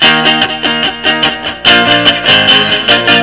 island music

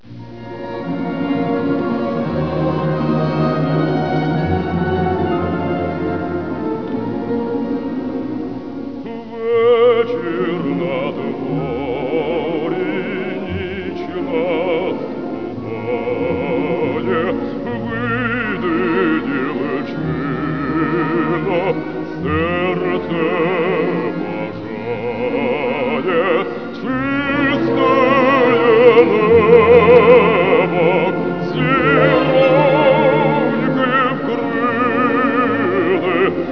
Ukr song

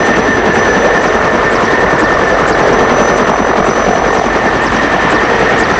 chinook; de ironblood

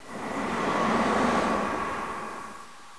frost armor target; warcraft3

